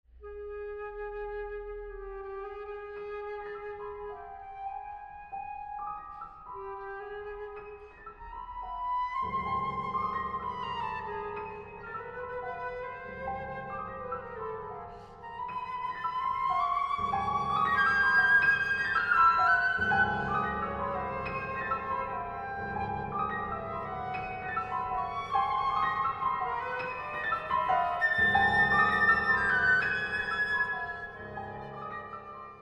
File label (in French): Sonate pour flute et piano